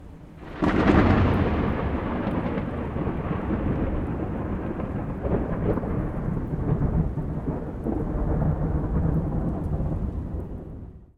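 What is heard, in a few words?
background Tempestade - Thunder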